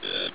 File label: cricket